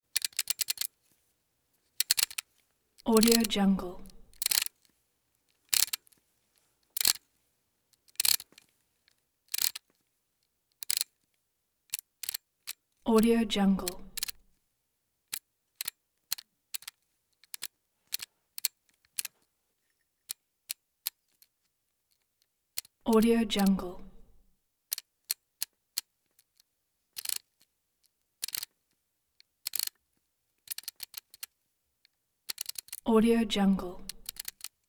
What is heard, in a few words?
synchronizer 35 mm footage counter being reset